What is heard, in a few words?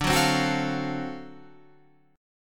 D 7th Sharp 9th D down Guitar Open F; D7#9 strummed